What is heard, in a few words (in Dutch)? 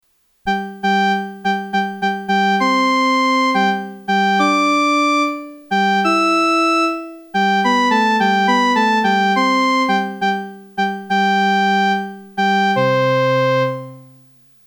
muziek herfstliederen trara zo blazen de jagers instrument eenstemmig